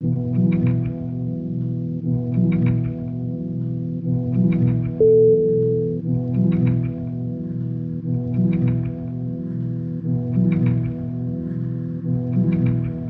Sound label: erhu meditation